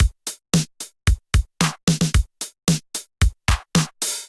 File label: 707 Kit groove 112 bpm; MIDI Clips; 111 - 130 bpm; 707 Rok With-U Kit groove; Ableton; Factory Packs; Drum Essentials